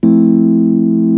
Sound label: Rhodes Chord; Mo' Phatt; Digital Sound Factory Mo' Phatt